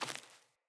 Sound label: Sandals Run Vegetation, Footsteps